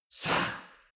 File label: choir voice male whisper